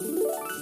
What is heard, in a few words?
big Win Loop; fruits